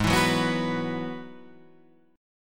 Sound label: Ab Minor 7th Sharp 5th Ab, Guitar Standard; strummed; 4,7,4,4,7,7